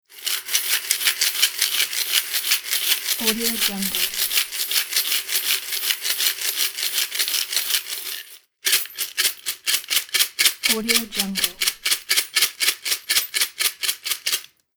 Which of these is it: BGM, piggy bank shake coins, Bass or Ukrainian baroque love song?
piggy bank shake coins